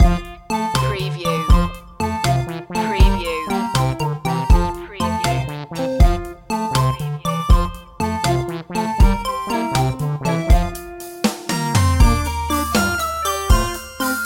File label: retro video game